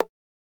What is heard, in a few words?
touch type, ChromeOS Sounds